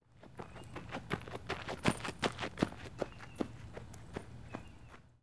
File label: Footfalls Gravel Running; footsteps